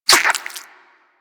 Vocal Tranq Grunt

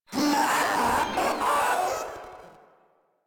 Proto Infested vocal attack; Warframe; InfestedNinetyNine

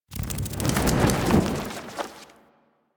Infested K Drive Boost Slow Stop, Vehicles

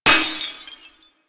Glass Impact; Warframe

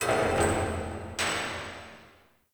Under City Distant Metal Clang Ring

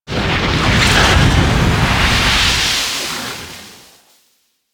Tengu agile idle; Warframe

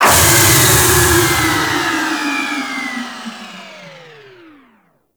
Stealth Movement Wind Down; Warframe; Vehicles; Archwing Stealth

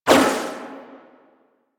Guardian Shell Impact; Warframe; Focus; Vazarin

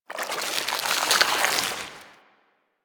Tendril Grasp Tendon Start; Warframe; Broken Frame

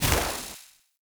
Casting Device Impact Default; Weapon Impacts; Player; Casting Devices; Ode Casting Device

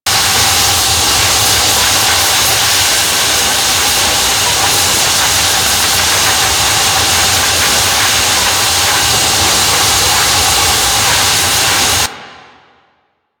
Fortress Gas Trap Loop

